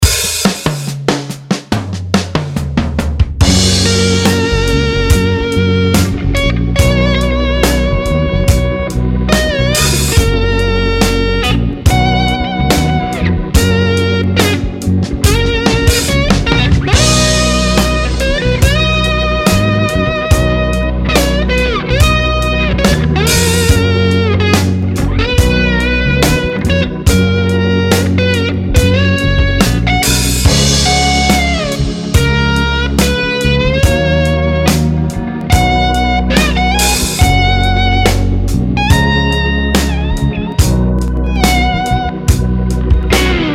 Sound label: slide solo